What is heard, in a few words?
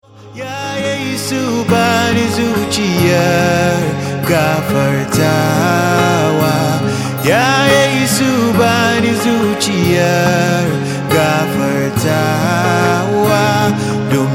RINGTONE